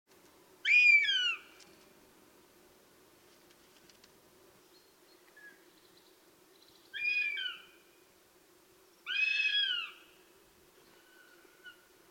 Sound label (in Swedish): duvhök tiggläte; tivedens np; vogelstimmen; patrik berg; boungar